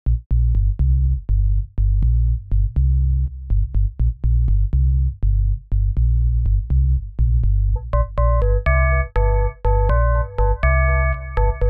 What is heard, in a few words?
Arturia Pigments 3 Twiddling the Harmonics Engine